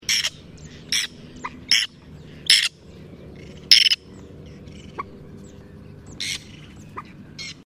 guifette moustac

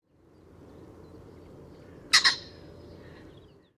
faisan de colchide

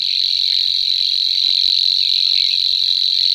locustelle luscinioide

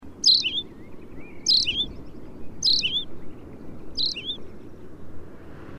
pipit rousseline

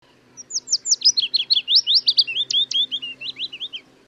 pouillot fitis

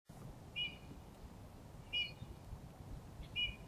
bouvreuil pivoine

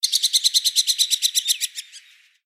martinet a ventre blanc; Chant tambouriné perçant : {AxTG} (ti\\)*; Cri : quasi-identique au chant

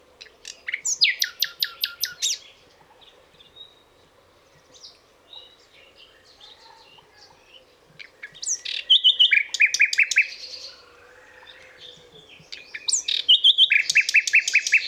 rossignol philomele

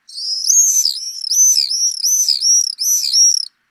martinet noir; Chant sifflé grincé : {Ax} (si.ri)*; Cri : quasi-identique au chant

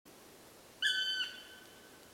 pic noir

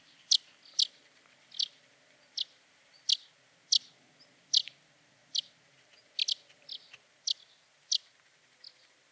hirondelle de rochers

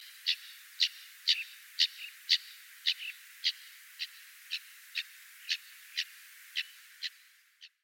perdrix bartavelle; Chant tchatché saccadé : {Ax} (tchik)*; Cri : quasi-identique au chant

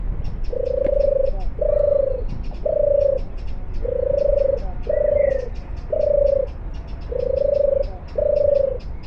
tourterelle des bois; chant de la Tourterelle des bois; rrrouh qui est le chant de la Tourterelle des bois

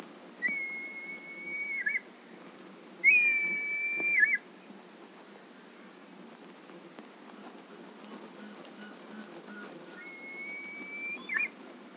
orn milan royal; Bec crochu gris à base jaune Gorge blanche; Chant posé perçant : {A2} pyuuu.yu; Cri : quasi-identique au chant

